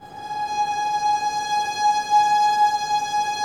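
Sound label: strings, Vlns Bow FX Sord.; Roland L-CD702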